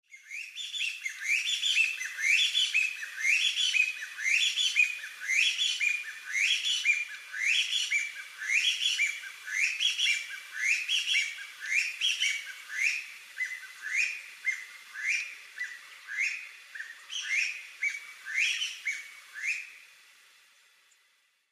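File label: Chinese Bamboo Partridge; koe; oita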